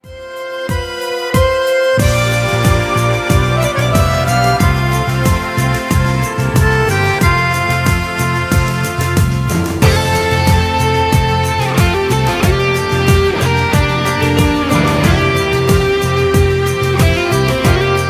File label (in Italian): anima solista romanza